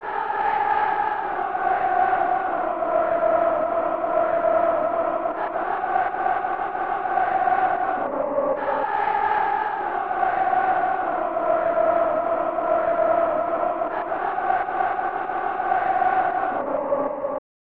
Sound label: Crowd Chanting; kick off 3 1994 snes